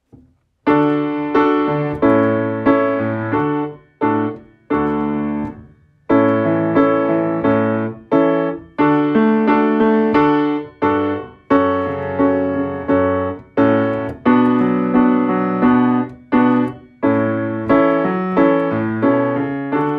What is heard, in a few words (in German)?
Klavier; Fuehl mal Hoer mal